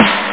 swing snare

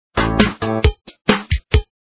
back Sound; dinofaster

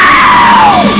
oww; Sounds Terrific II (1996); Weird Science

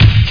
BASS DRUM; CDTV Lemmings

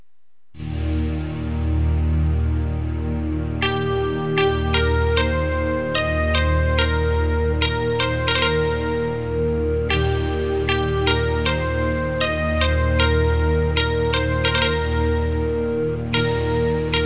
wf china, world; MIDI Master Collection 2

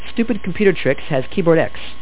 Keyboard

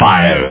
fire

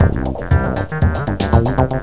Fast Drum; Cloudz 1.0.3

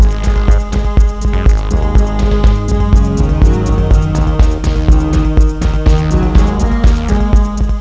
dumb bass groove; meetmediaband

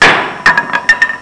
wood dropping; Sound Effects for Multimedia - Volume 1

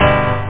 RIDE; Instruments

